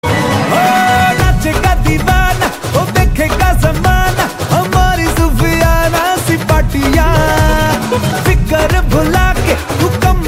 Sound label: wedding dance tone ringtone, bollywood